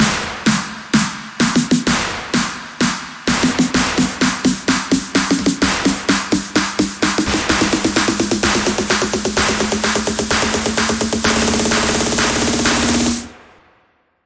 128 bounce reverb snare fill climb, drum loops and breaks